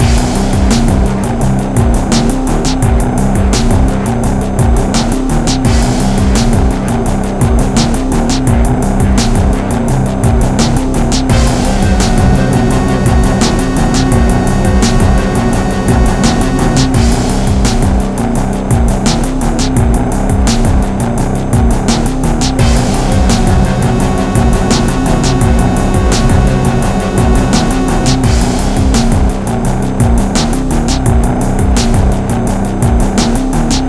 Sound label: end music; thereisnogame